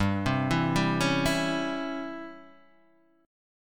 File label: C sharp Minor Major 7th Flat 5th, chord